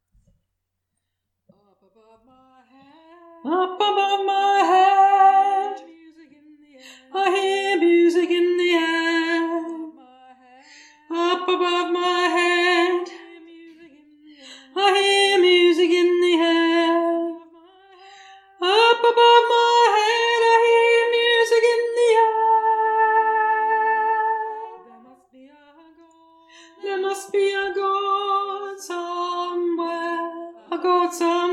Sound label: up above my head soprano